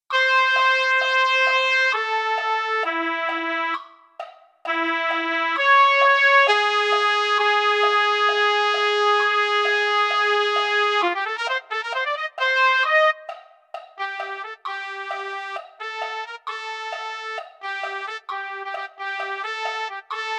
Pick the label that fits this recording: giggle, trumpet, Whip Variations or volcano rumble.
trumpet